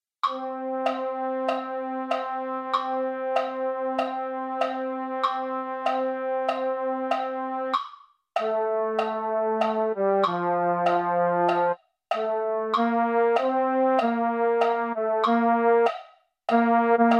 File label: baritone, MIDI